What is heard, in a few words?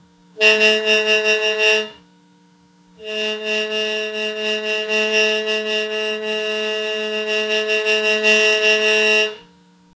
an antique 1900s low pitc